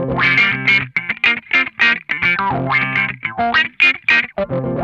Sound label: GRAINY WAH, Funk Guitar; Zero G - Funk Guitar